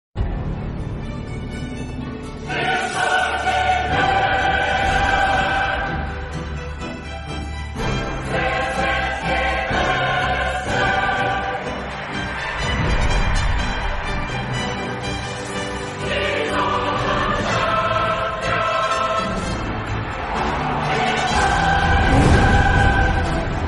Himno; de la champions League

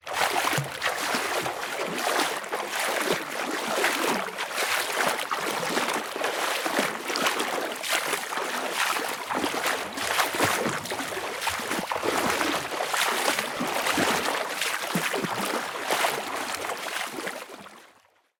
Efecto de Natación Persona Nadando piscina con personas

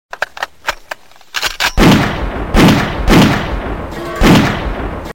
Efecto y sonido de disparo

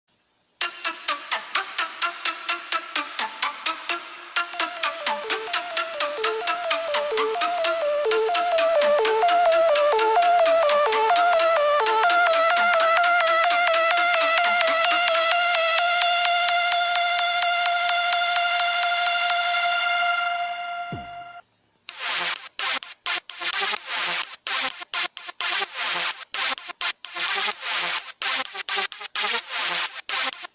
musica electronica para jugar videojuegos corta

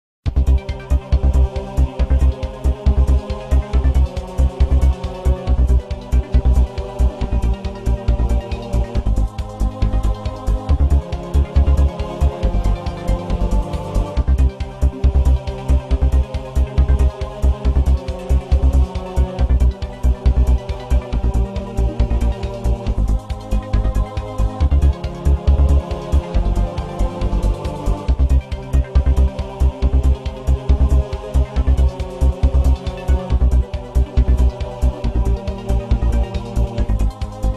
Música preguntas; Quien Quiere ser millonario

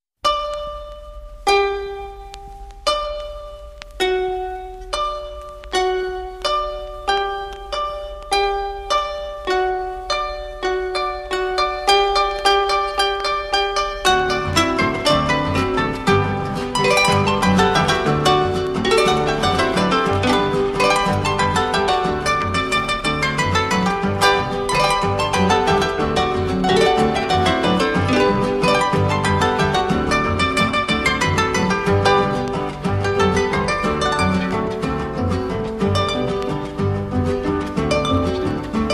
ARPAS EN STEREO PAJARO CAMPANA

